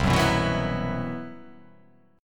C Major 7th Flat 5th C

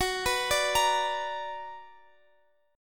B Minor Major 7th Gb, chord

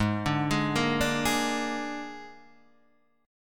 G sharp Suspended 2nd Flat 5th G sharp, chord; G#sus2b5 Chord {4 5 6 3 3 4} arpeggiated

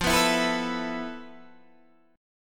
F Major 7th Flat 5th Guitar DADGAD; down; chord shape

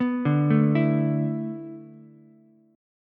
B Minor 11th B down Ukulele C6; Bm11 arpeggiated; JGuitar

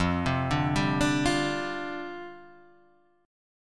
C Suspended 2nd Suspended 4th, F

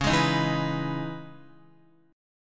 C sharp Minor 9th C sharp Guitar; C#m9 strummed; JGuitar

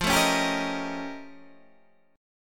Bb Minor Major 13th

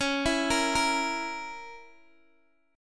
Db Minor 6th Db down Guitar Open G

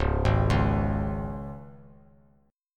Db 5th Db down; Db5 arpeggiated; JGuitar

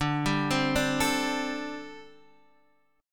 D 7th Suspended 4th, D, down, Guitar Open D

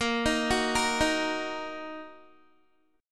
A sharp Suspended 4th Sharp 5th down Guitar; arpeggiated; A#sus4#5; JGuitar

